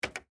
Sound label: Computer Keystroke, Technology, Computer, Keystroke, Single, Large, Terminal, Keypad, Vintage; SoundEffects-BlastWave